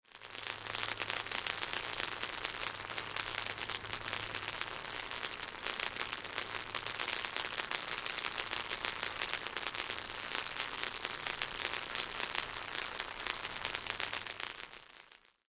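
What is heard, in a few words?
sounds of welding machine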